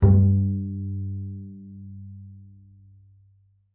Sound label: G2, bass pizz; Xsample VOL-5